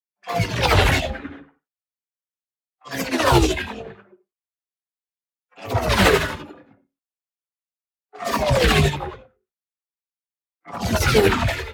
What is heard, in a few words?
WHOOSH SMALL Flicker Zip; boom library; Matter